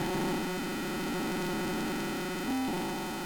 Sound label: Broken Computer